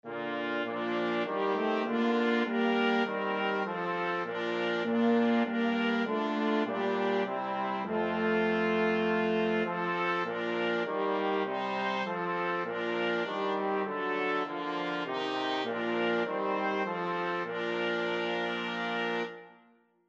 flexible mixed ensemble, 4 players

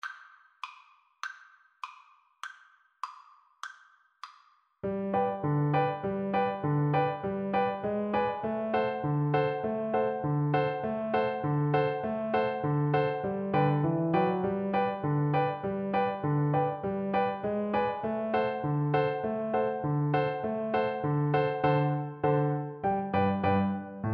clarinet polka

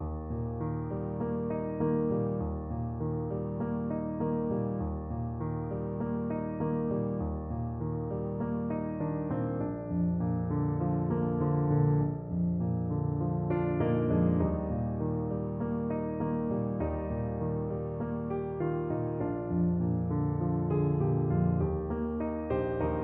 skip to my lou, Tuba

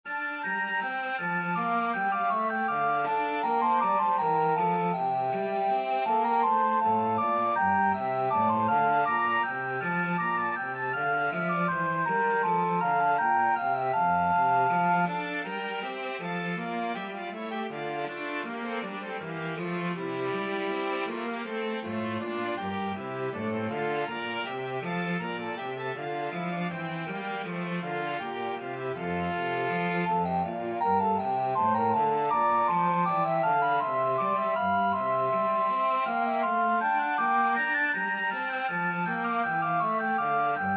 bourree, flexible mixed ensemble 6 players

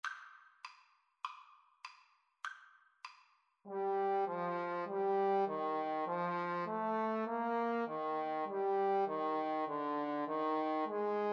Trumpet Trombone Duet

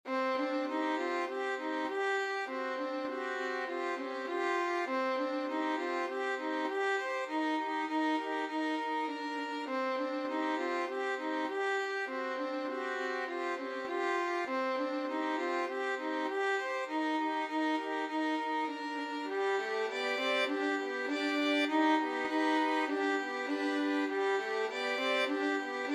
mountain king, violin trio; grieg

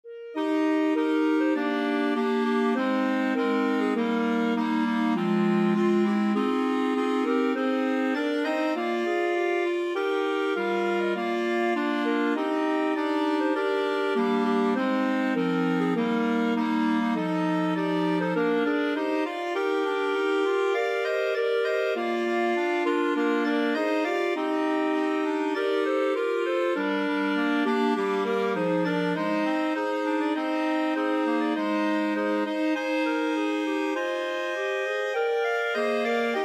national anthem russia, clarinet quartet

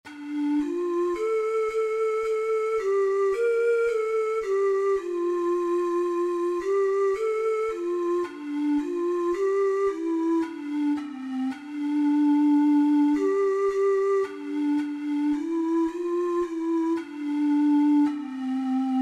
emmanuel, ocarina four hole

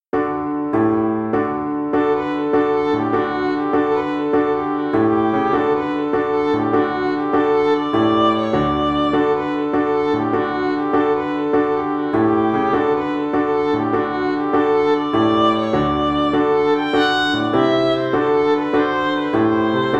jig violin

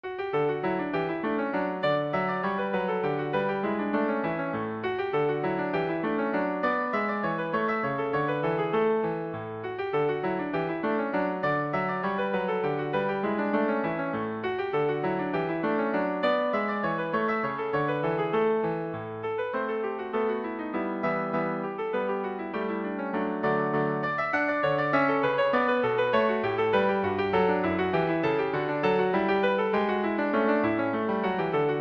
hook hornpipe, piano

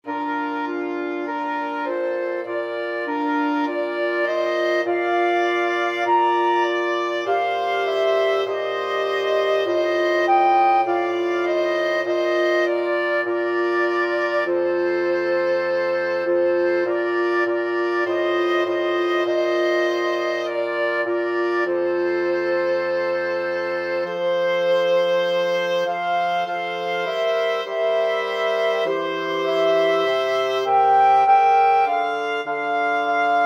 mozart ave verum, wind quartet

